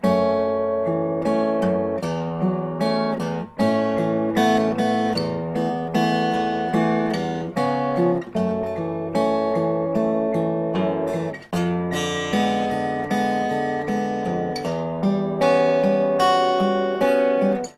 Guitar

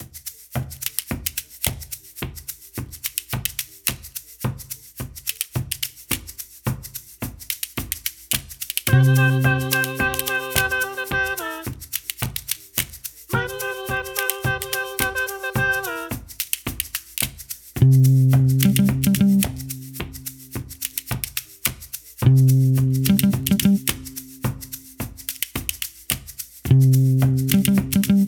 MX Fishing Village Interior; burly men at sea ios macos ps vita ps4 switch windows gamerip 2016

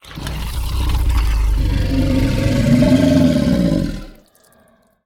struggle

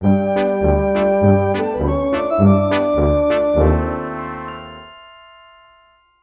Tuba

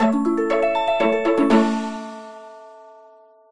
Cooking level up

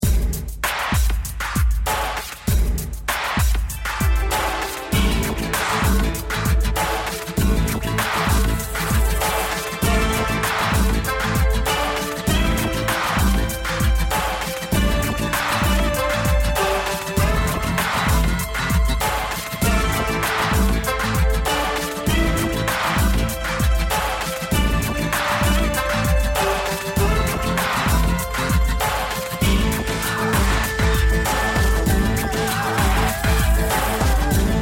bgm field; goken

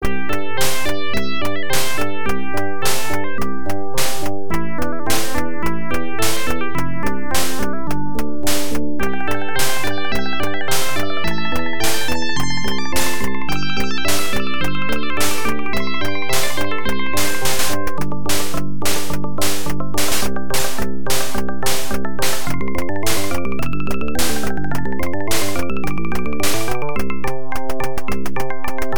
Round BGM; Shanghai 2; arcade

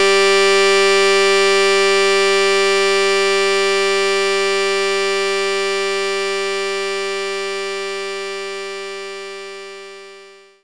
M5th wave G4

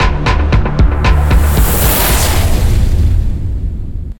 Transition; Everspace 2

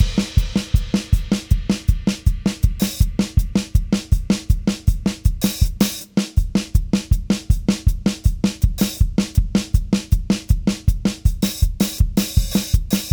Music Body Perc; GnomeGnight

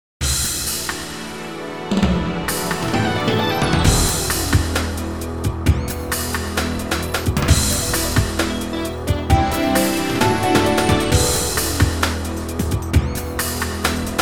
OPENING THEME; Lagnalock; tilde game music collection vol 1

